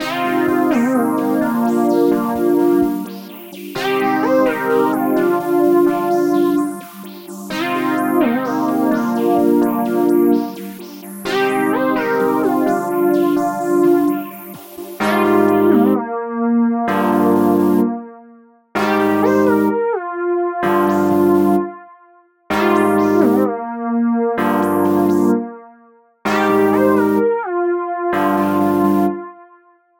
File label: 128 Cm